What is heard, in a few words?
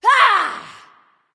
grunt attack; smite; nike